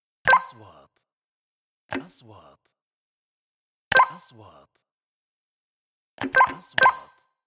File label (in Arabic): نغمة كاشير, transitions